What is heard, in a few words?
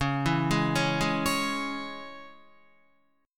Db Minor, Guitar; arpeggiated; JGuitar